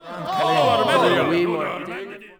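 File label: men murmur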